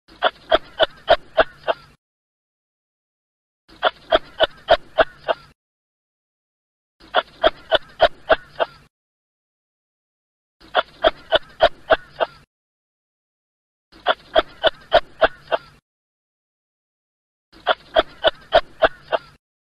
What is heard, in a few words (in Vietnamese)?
Tiếng con chuột túi kêu